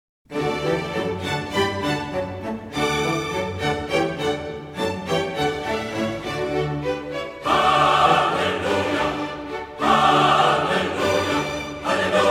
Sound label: Chorus Hallelujah